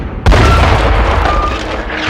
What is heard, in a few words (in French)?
bruit canon tir